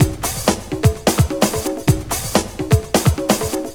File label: House Beat, 128 BPM